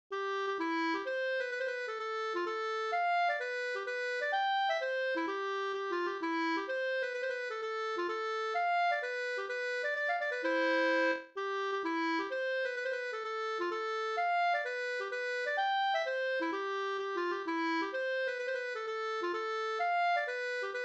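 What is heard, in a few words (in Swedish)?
polska lillpolska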